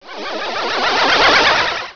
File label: haste, orcs spells; war2